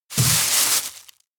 bag place ground; anemoi

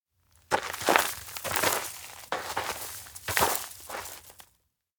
steps outdoor mountain road search; awayuki; anemoi

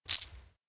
shogi pieces rattle; anemoi

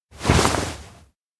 swing arms cloth; fumiya; anemoi

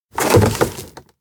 box place ground; anemoi

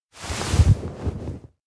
pull in hug; awayuki; anemoi

